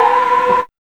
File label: CHORD